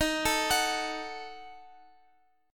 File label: Eb Diminished, Guitar, Open F; Ebdim arpeggiated